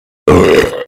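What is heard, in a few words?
sound of burp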